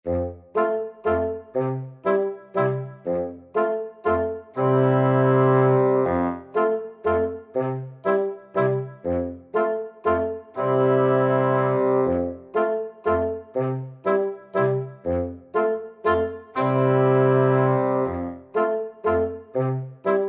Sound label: Sad Clown Waltz